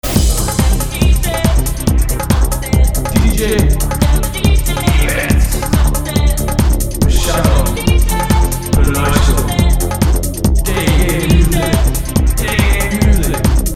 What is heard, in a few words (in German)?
JINGLE kurz; DGMUSIK